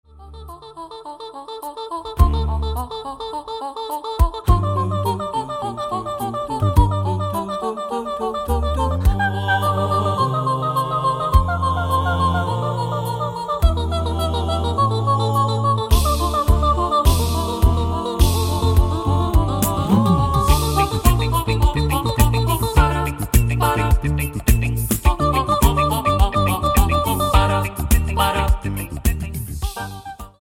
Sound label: Toccata fugue Symphony